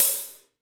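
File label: HH, hi-hat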